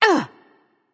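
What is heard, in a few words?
grunt attack, female; smite; voicepack; hera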